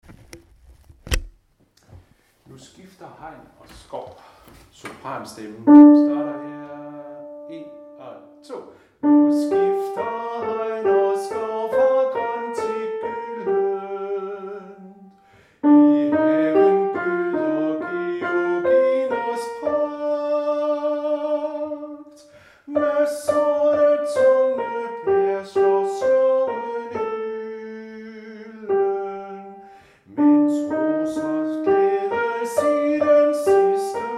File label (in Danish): sopran, harlev koret; Nu skifter hegn og skov